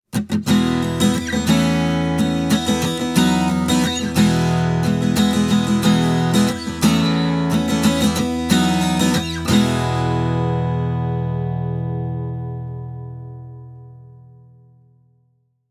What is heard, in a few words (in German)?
Westerngitarren Tanglewood TW28 CLN solo; Rockway